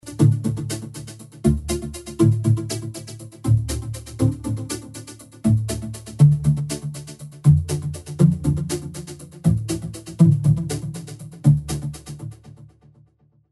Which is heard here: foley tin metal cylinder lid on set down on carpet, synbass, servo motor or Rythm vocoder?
Rythm vocoder